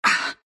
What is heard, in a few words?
pain, ru